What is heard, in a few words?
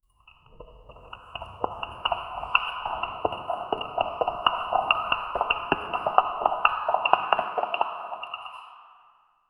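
high detail ambience, cave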